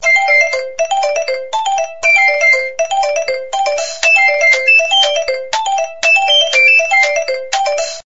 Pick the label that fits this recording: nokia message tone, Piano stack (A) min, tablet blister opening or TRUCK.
nokia message tone